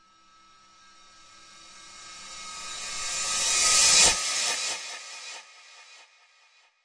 fancy reverse crash fx, DRUMS (SINGLE HITS), Crashes